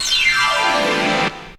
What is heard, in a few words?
FX; AMG Megabass REMIX! VOL-2